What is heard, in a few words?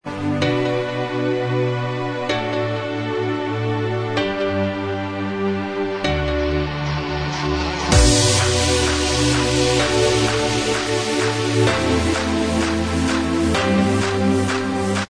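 remix instrumental track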